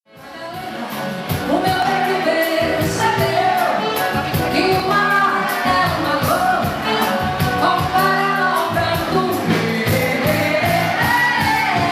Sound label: SINGER, Spanish Brazilian Portuguese